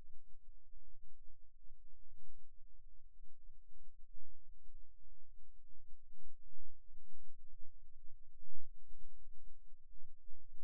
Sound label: Rhythm Seizure, eeg